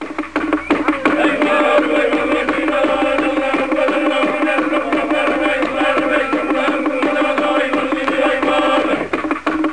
african, music; ClickArt 300,000

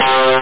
rythm gui; Aminet 21; GTI - Schatztruhe

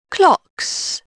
clocks; Dorling Kindersley My First Incredible Amazing Dictionary

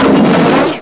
explos; soloassault

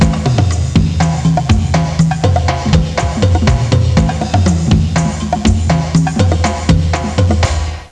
Drum Solo; Koji the Frog 2.0.1

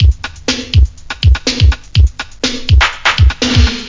breakbeat; World of Sound; instruments; beats

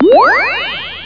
Jump Ball; dithell in space

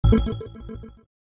life gain; chichi; Aventura

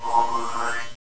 Sweeper pass; Prometheus 1.0

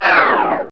bonus out; Outbreak

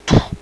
blow, voice; Web Clip Empire 150,000

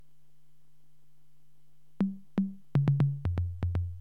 RYTH

